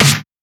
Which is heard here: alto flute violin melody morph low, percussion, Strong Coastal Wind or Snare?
Snare